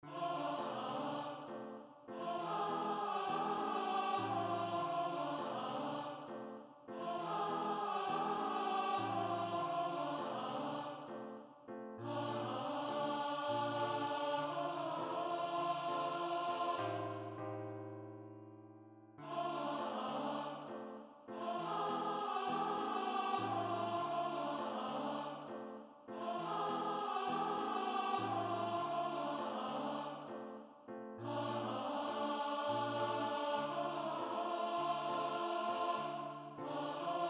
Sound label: Agua SOPRAN